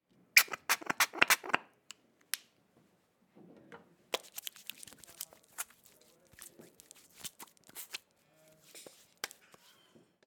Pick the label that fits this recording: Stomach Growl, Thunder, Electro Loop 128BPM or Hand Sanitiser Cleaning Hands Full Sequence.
Hand Sanitiser Cleaning Hands Full Sequence